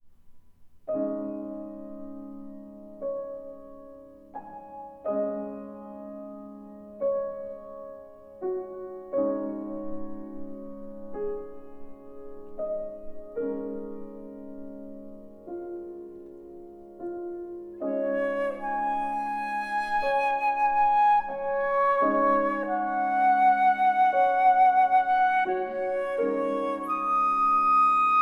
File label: Flute Sonata Movement 4